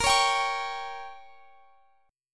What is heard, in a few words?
Bb Minor 6th, Guitar, Drop D; x,x,20,18,20,21